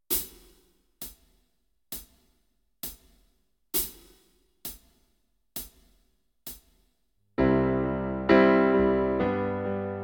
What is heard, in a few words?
Backing track, keyboard; Kenneth Baker - The complete keyboard player